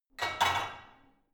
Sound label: barbell only replace, fitnessstudio